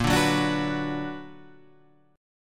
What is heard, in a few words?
B Minor Bb, Bm/Bb chord, guitar; strummed